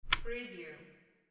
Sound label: light switch